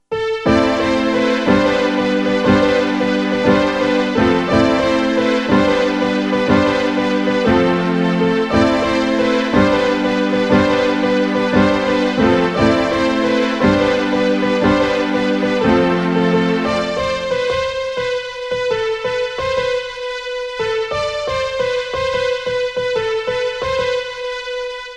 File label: music mix